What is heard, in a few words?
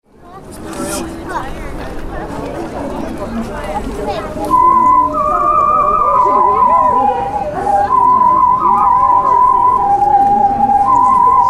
singing tubes kids; marrion square